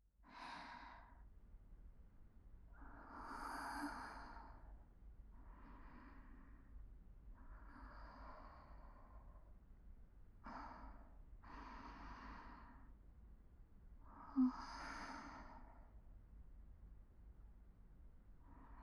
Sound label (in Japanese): 特典トラック 寝息(右側)